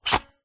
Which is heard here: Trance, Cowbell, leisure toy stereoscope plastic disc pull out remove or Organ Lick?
leisure toy stereoscope plastic disc pull out remove